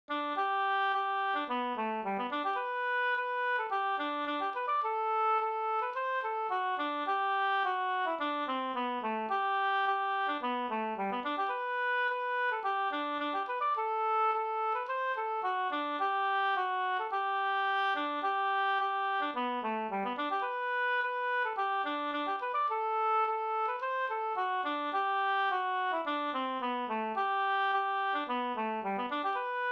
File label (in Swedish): ganglat; Festmarsch; G Orre